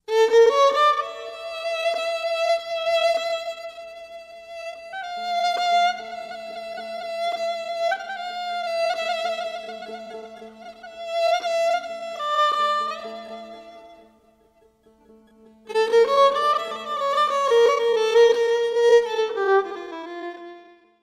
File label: ghaychak; قیچک